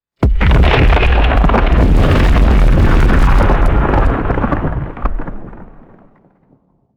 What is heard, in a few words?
distant glacier cracking and ice debris falling; natural disaster